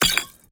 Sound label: potion up; skyrim